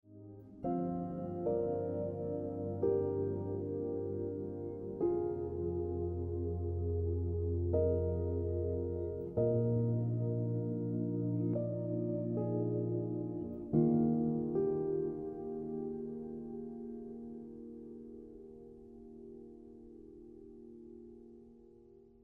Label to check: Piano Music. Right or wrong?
Right